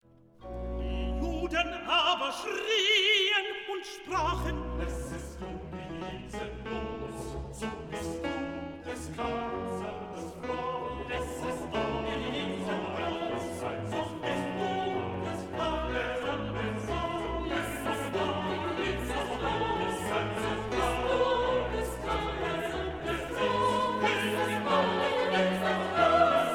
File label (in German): BASS, Stimmen mit Klavier; Johannes Passion